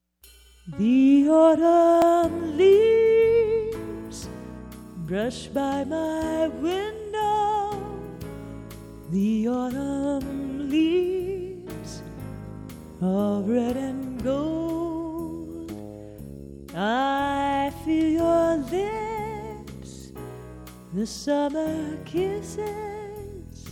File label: solo